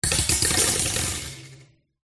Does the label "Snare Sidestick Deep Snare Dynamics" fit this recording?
No